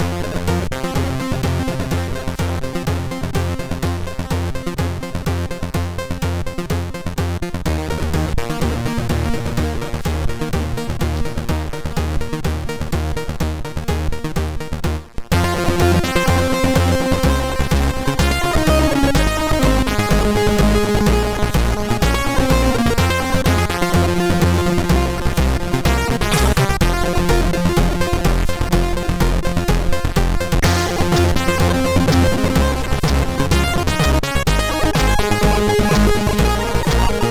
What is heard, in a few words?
Disco, MOS6581R2